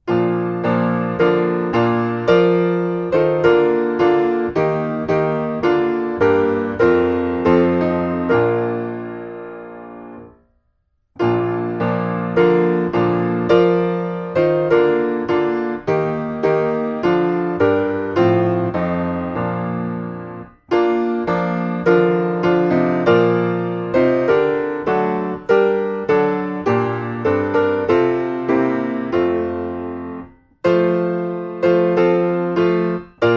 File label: piano; Hymns; Angels from the Realms of Glory